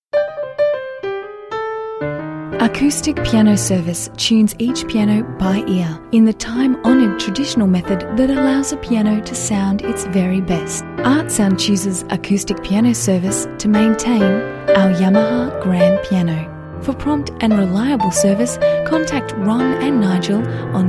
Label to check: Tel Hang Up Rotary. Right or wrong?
Wrong